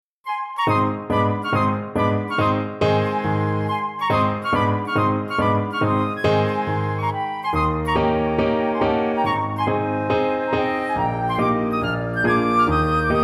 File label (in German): Rondo militaire mit Klavier